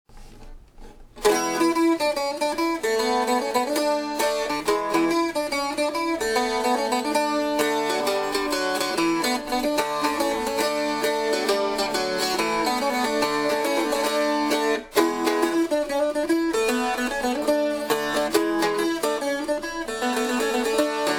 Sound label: tenor cittern example